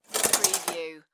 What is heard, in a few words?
Shower Curtain Close; sound effects bible